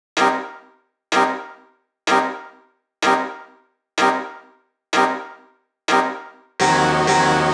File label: 126 C Eb music, Funky Underground; Loopmasters; Funky Underground Vol 2